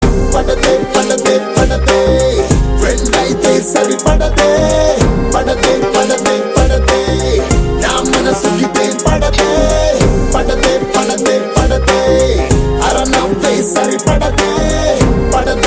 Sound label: Telugu BGM; Enemy Telugu Movie